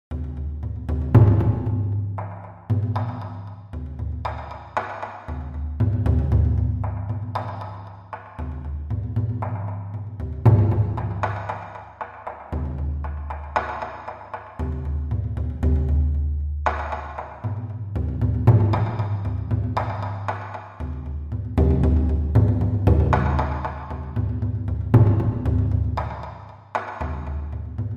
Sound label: Celts And Drums, Drums Only